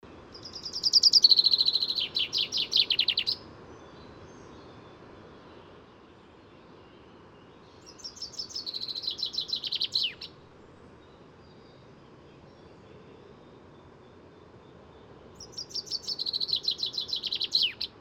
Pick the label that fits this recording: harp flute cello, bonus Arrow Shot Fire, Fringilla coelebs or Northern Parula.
Fringilla coelebs